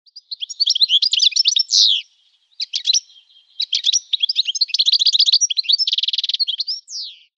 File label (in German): Stieglitz Carduelis carduelis; Vogelstimmen